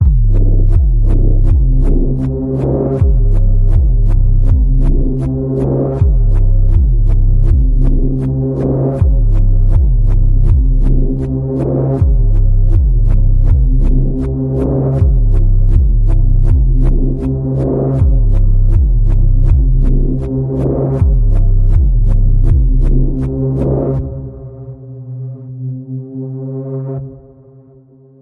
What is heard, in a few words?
Panning Pulse, Key of C